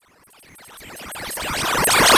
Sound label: Sine FX